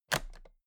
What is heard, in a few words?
toolbox latches close heavy